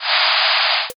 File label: curtain open